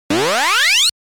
Snow-Bee Spawning; Pengo; arcade

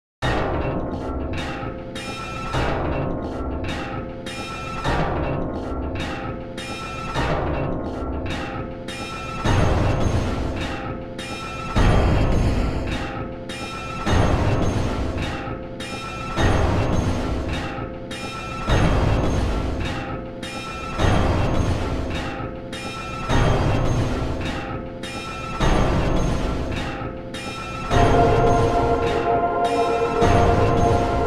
Ringing of Chaos Bells; Silent Hill 3

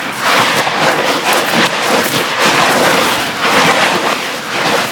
dirt slide loop; ice age scrats nutty adventure

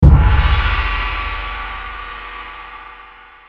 Buddah Bell

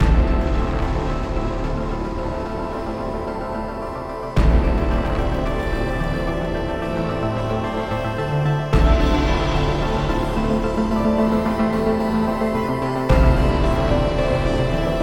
PUZZLE SOLVED LOOP; escape academy; The Confrontation

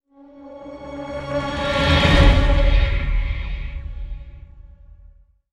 swell design; transformers war for cybertron gamerip; IACON INVASION

